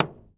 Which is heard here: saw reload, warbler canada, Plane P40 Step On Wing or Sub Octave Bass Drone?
Plane P40 Step On Wing